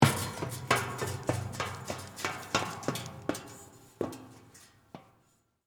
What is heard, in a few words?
Metal Stairs Up, Footsteps, Metal, Staircase, Walk, Up, Fast